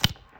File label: throw down, cards; aliens